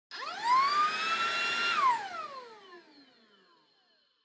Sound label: Vacuum Cleaner Vacuum High Short